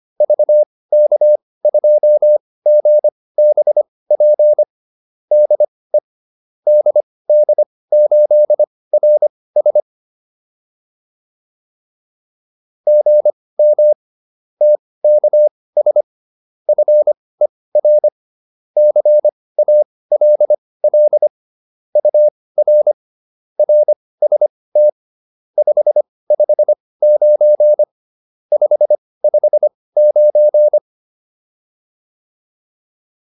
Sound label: QSO, intermediate practice files